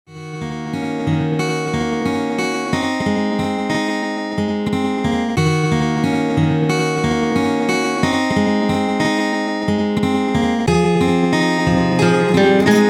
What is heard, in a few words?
jingle